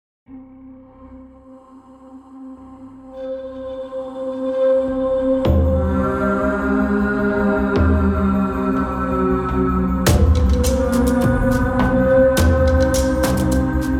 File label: Wolf Time, Tension